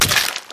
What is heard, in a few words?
stationary kill; among us